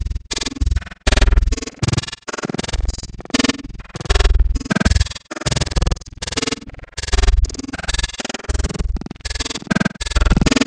droid beatbox 090bpm; Zero-G; Downbeat Dance Grooves

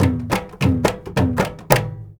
India, Heart of Asia

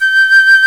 PICL; Roland - LA Composer vol. 1; WOODWINDS

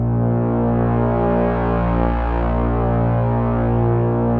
Prophet 5 B2; Hollywood Edge - Giorgio Moroder Rare Synthesizer Collection

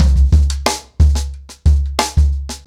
90BPM, ACOUSTIC DRUMS

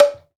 African Perc

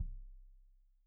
Kik Stereo; Deeply Sampled Drums; SMDrum

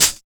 D.HAT R; East West - Phat & Phunky CD2; 90's Sample CDs

